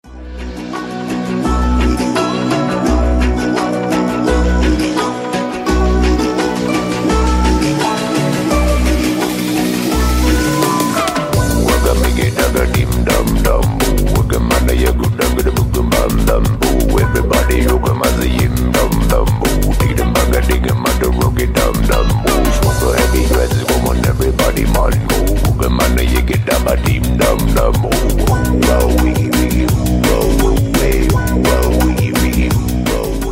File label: mongolian reggae drum bass